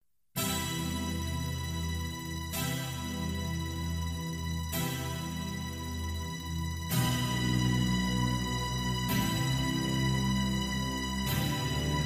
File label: X'an Devotional Karaoke